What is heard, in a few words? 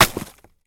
industrial tools axe chop wood; sound effects one